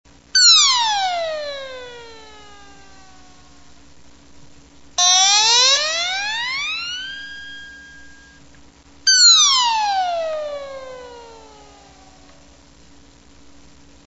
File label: slide